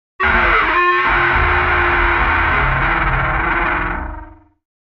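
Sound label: Spaceship Door Docking, sci-fi space fx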